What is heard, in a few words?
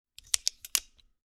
Part Assembly; TOOLKIT vol.2